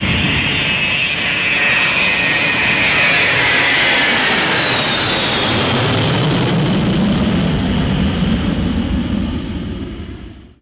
boeing 707; Simutrans; Pak128.Britain-Ex

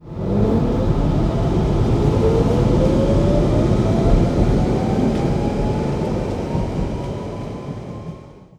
1992 stock; Simutrans; Pak128.Britain-Ex

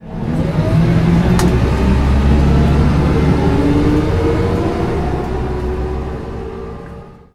leyland lynx; Simutrans; Pak128.Britain-Ex

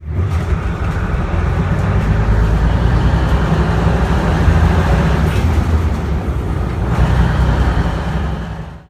leyland leopard; Simutrans; Pak128.Britain-Ex

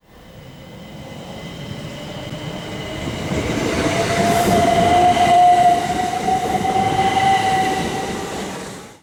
class 375; panos g; simutrans; Pak128.Britain-Ex

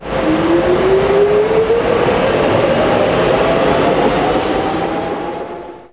dlr; simutrans; Pak128.Britain-Ex

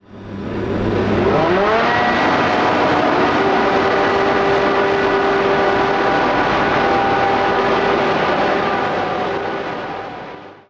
class 85; simutrans; Pak128.Britain-Ex

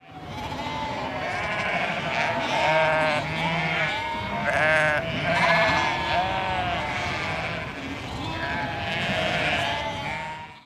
sheep farm; Simutrans; Pak128.Britain-Ex; felix blume

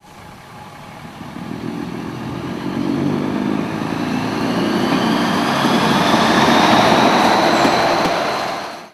lightweight; derby; simutrans; Pak128.Britain-Ex

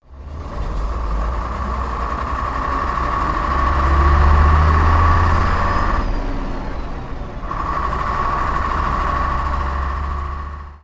guy arab 4; Simutrans; Pak128.Britain-Ex

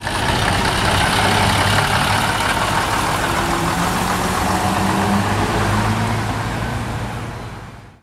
bristol k; simutrans; Pak128.Britain-Ex

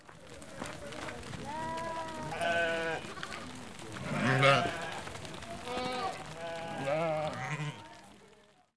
flock of sheep; sandeepkurissery; simutrans; Pak128.Britain-Ex

